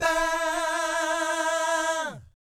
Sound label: VIB FX, Jazz FX; Spectrasonics; Vocal Planet; JAZZCOMBO